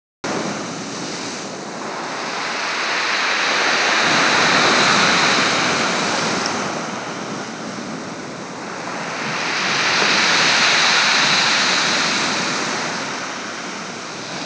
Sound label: torbay waves; channelswimmer; swimming